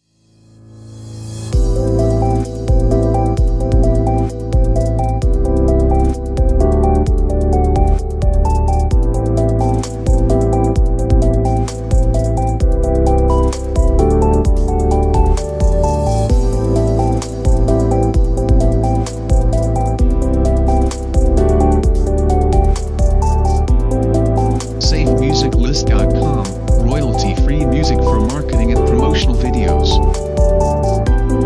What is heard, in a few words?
Ocean View Lounge; SafeMusicList